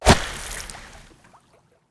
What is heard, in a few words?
water hit, damage; DCS